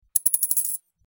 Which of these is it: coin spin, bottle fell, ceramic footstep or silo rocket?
coin spin